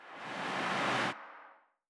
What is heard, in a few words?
combo Tease; netent